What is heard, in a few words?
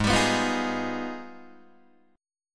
Ab Minor Major 13th, down, Guitar, DADGAD